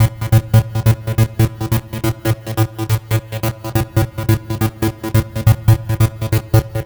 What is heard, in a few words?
140 House Arp Amin; Dance; DJ; Synth; Arps; Pro Tools Essential Loops; Digidesign